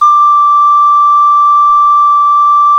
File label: CLAYFLUTE, Heart of Africa